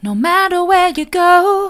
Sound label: soft, vocal